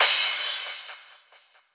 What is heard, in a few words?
Cymbals Crash; Vengeance Samples